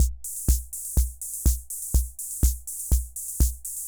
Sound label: Ace Tone Rhythm Fever Loop